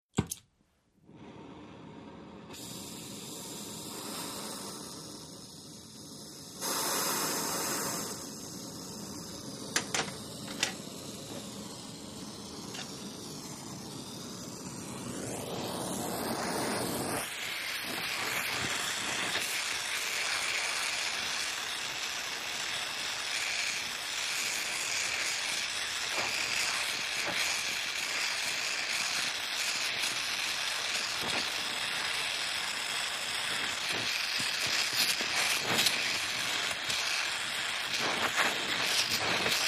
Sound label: Welding, oxygen - acetylene ignite, pick up solder, weld